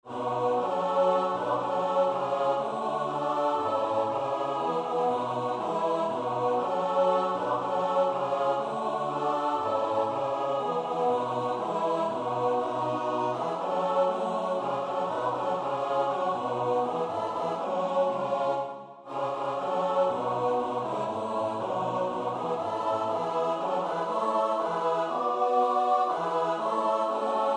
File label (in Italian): O bene mio (S-A-T-B); Willaert (Adrian)